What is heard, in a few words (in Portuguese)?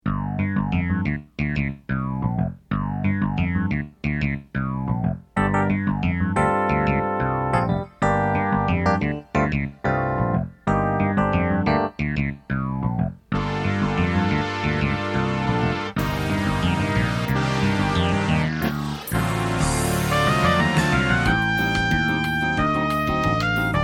samba do cabulador